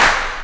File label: clap, hip; Best Service; Dance Mega Drums